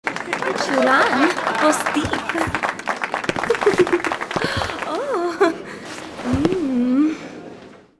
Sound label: dance outro good; Sid Meiers Pirates; XBOX